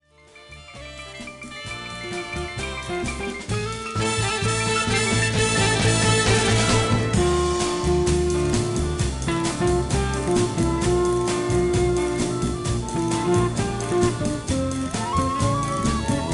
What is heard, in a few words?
Jazz